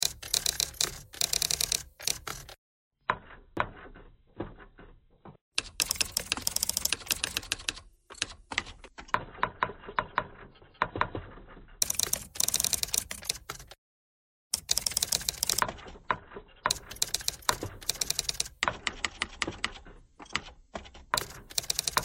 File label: typewriter thread simple